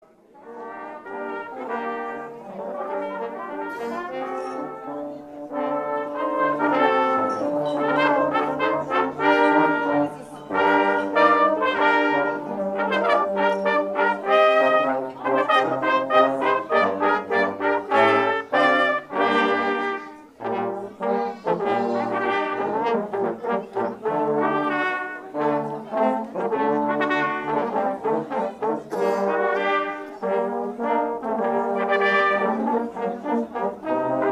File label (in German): Marsch in G Moll